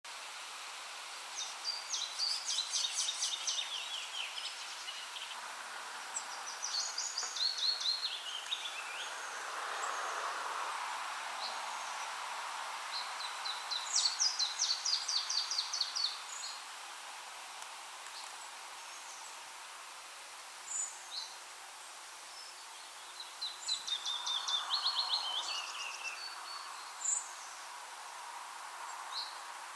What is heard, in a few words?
chiff combo